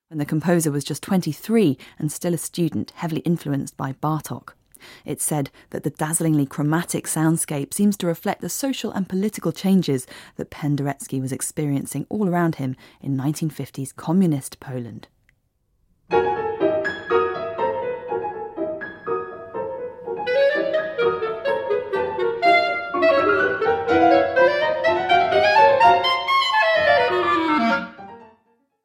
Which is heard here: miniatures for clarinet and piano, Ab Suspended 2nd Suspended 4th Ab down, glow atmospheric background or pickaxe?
miniatures for clarinet and piano